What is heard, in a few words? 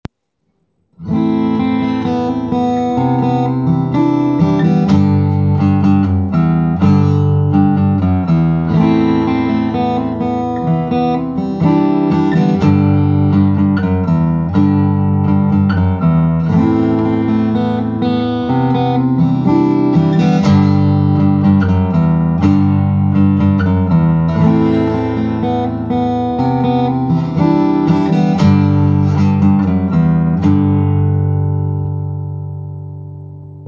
Acoustic